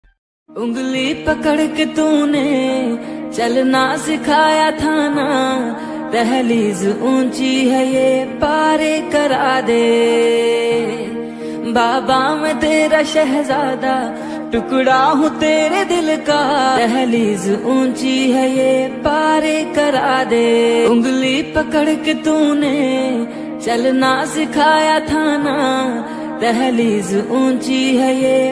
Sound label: fathers day special song love ringtone hindi ringtone